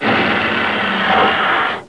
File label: breath; alienbreed3d2